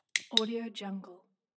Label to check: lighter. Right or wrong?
Right